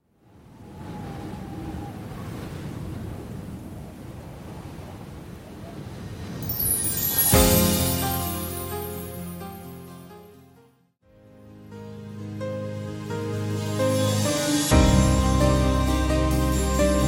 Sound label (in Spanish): Open Show Balada 130 Bpm